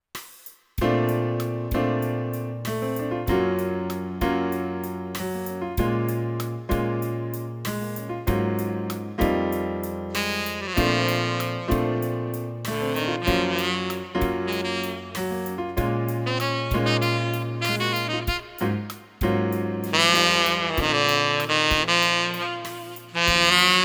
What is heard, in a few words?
piano, & sax